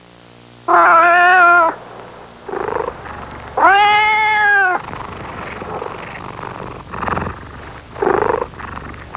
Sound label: Young jungle tiger meowing and trilling